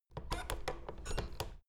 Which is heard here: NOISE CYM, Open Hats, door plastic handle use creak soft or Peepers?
door plastic handle use creak soft